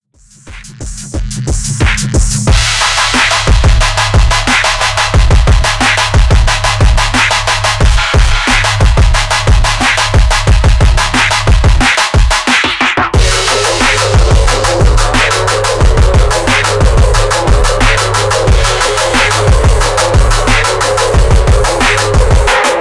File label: hard phonk